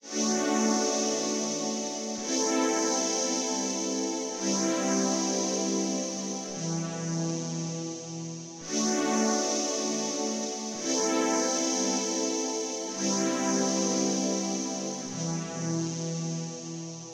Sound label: Spacy Loop